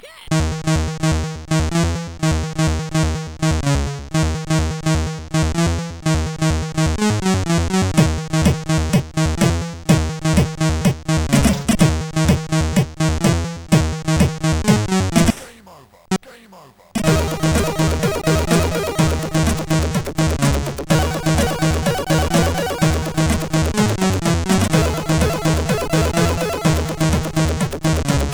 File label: Digi Music sid CSG8580R5